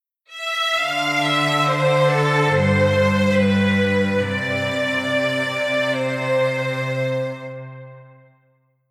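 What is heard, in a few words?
Strings Polyphony Bass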